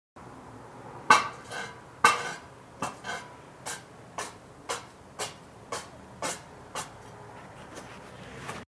Schaufel

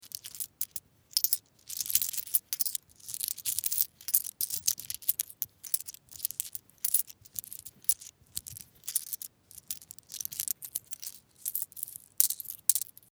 Muenzen zaehlen

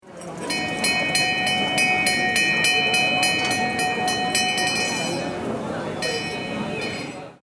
Schiffsglocke kleine

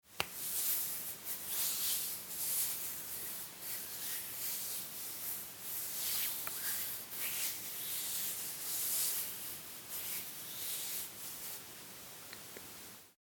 Wischen

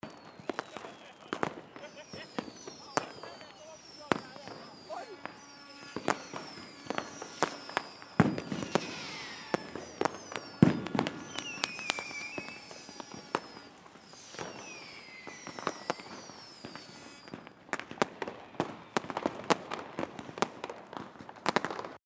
Silvester Raketen

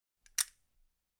Drums Percussion Kastagnetten

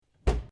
Herd schliessen

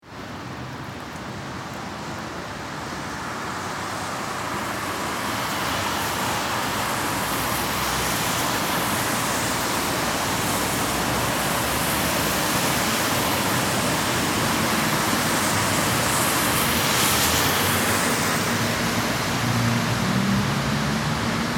Verkehr 4 spurige Strasse

